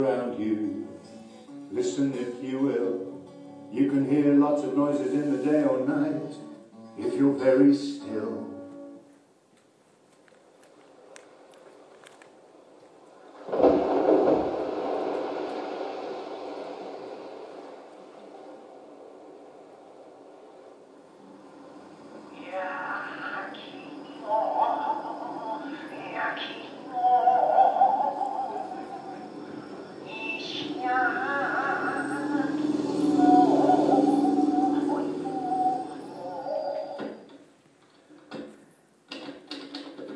some acoustic ecology species including laughing hippo; bbc s save our sounds